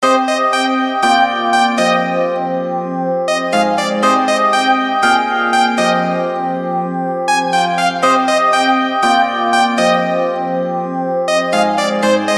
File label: pulsating rythm